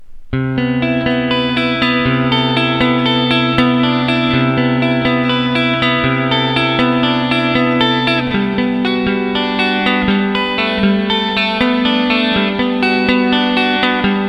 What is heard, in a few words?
Classic Strat Clean Neck; classic strat single